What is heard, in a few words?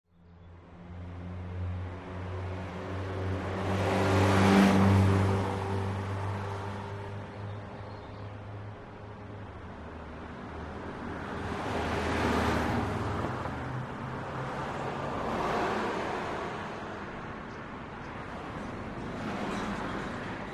Ambience: Suburban Street with Birds And Medium Car Bys.